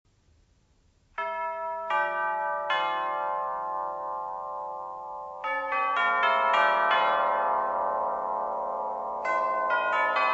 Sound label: chimes tubula bell